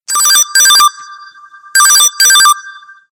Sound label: lg old phone ring